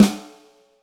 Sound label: Snare, Roland TD-20